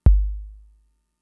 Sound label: Kick, Drum Machines Fricke MFB-522